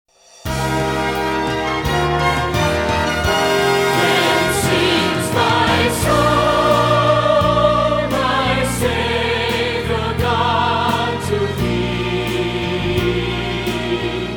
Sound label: hymn HOW GREAT THOU ART